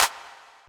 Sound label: fx lower